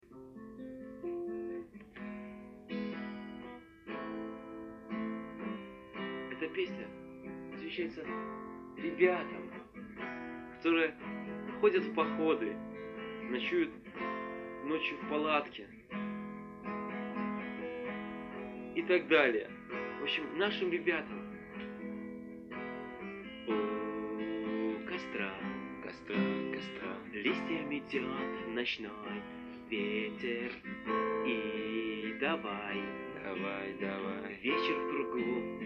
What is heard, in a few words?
unplugged